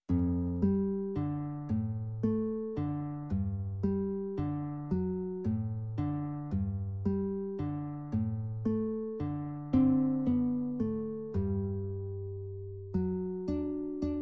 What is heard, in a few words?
accomp